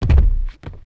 bodyfall; characters; death

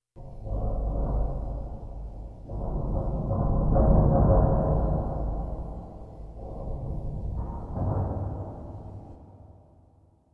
Metalic Rumble; Myst III Exile

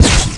Bullet Shield, Impacts

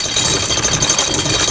grind chain; terrains; Tony Hawk's Project 8; XBOX

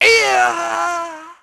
death; Pulse Racer

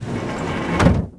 door sliding wood crude; doors elevators; Star Wars Obi-Wan; XBOX

